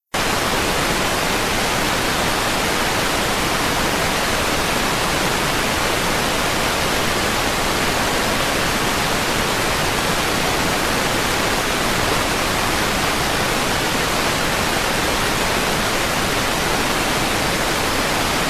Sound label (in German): UKW Empfang mit Pendler ohne Signal Rauschen